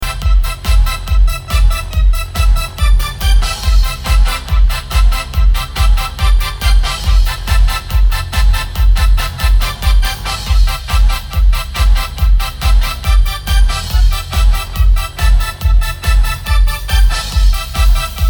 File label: Brass Jam